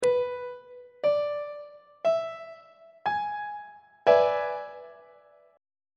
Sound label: d double sharp dominant 7th 2nd inversion on treble clef